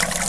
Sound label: water spray